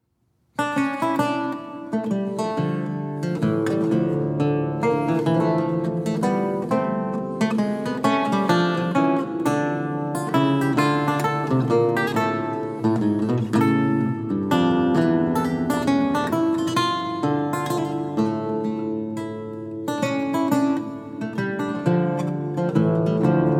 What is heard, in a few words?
Courante